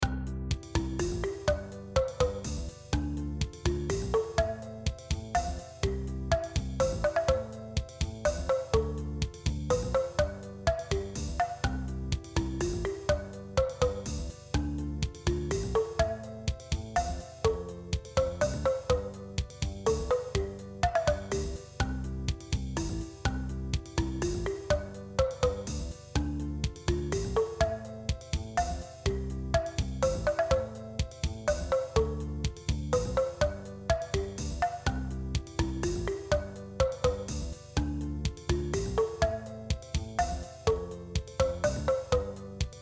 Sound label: bamboo marimba tempo 124